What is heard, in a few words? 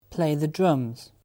drums